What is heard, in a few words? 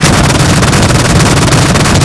ZU 23 2; Weapons; DCS